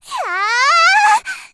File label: attack; Eternal Return; Tia; Voice Line; KOR